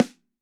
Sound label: SNARE EFX; MX 1335 BRS